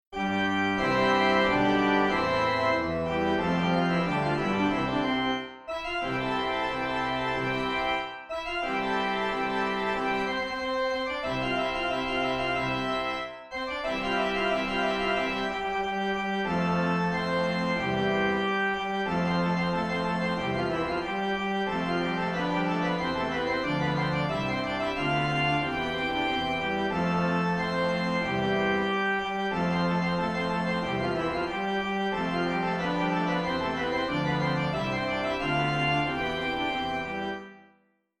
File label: Drums and Cymbals